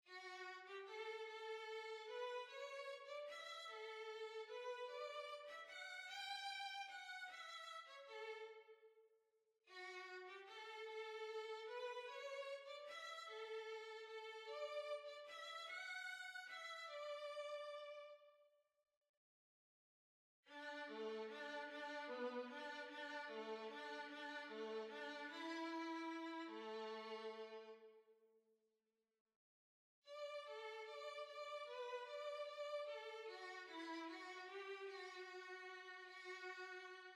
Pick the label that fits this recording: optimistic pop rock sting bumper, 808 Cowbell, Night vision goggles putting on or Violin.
Violin